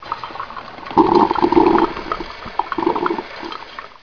Kaffeemaschine

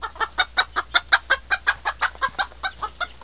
huhn gacker; geraeusche tiere

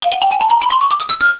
xylophon

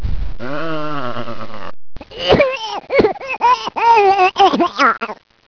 schaf krank; geraeusche tiere

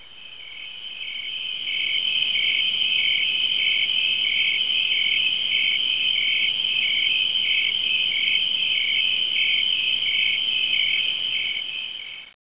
grillen; geraeusche tiere

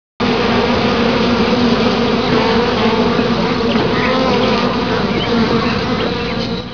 BIENEN; geraeusche tiere

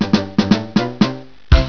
Tusch

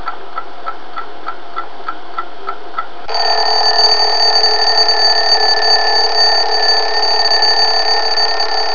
alter wecker

